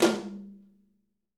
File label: TOM H, LA TOM